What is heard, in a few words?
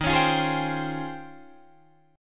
D sharp 13th, Guitar, Drop D